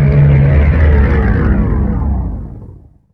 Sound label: VFX2 Sub